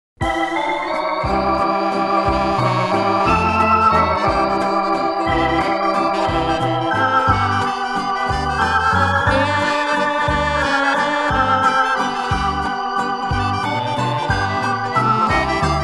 Waltz

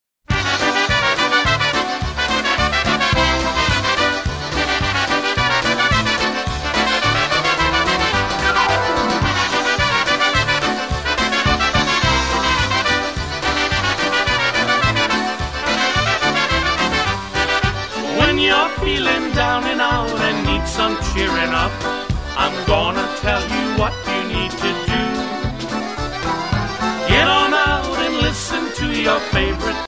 Polka

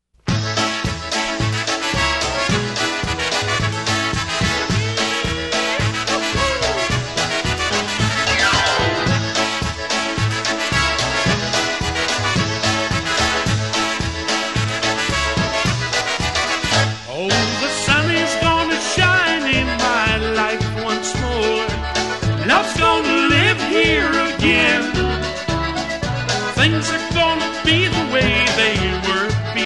Polka

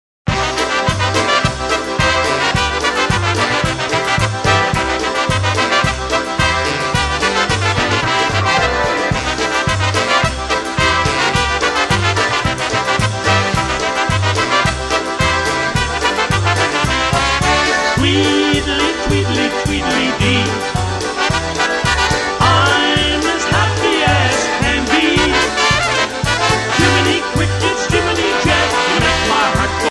Polka

